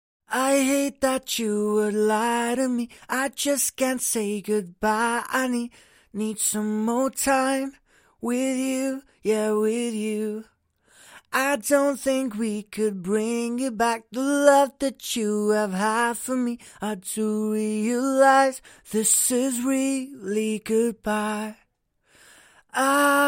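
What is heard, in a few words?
pop rnb 60 A minor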